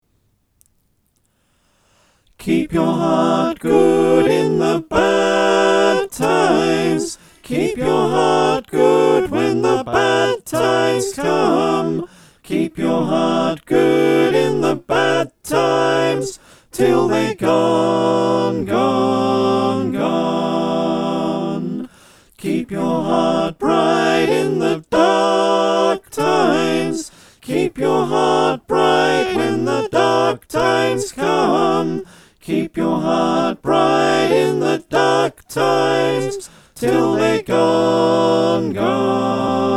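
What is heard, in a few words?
soprano alto tenor bass, choir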